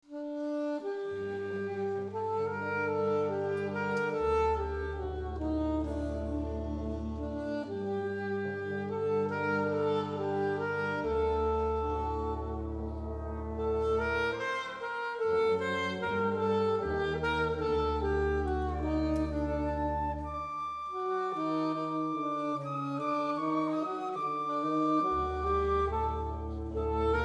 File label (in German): Konzert Israeli Folk Ausschnitt AB Stereo Hall